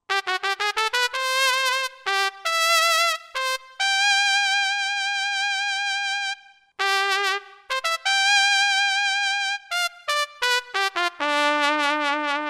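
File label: Mariachi Trumpet; World; Latin; Roland SR-JV80-18 World Collection Latin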